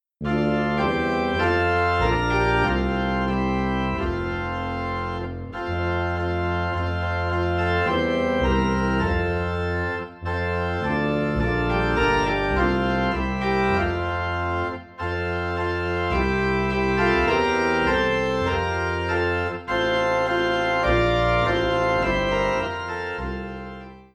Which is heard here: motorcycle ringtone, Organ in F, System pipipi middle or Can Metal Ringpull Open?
Organ in F